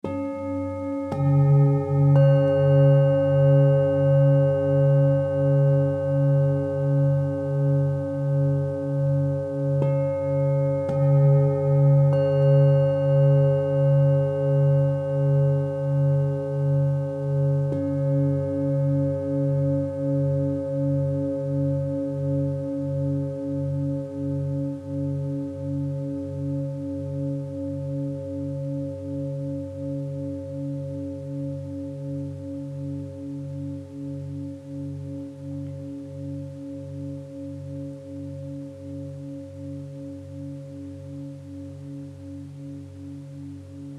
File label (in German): Klangschale